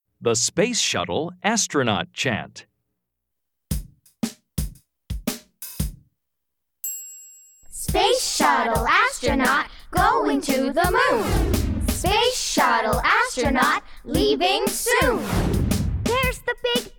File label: The Space Shuttle Astronaut Chant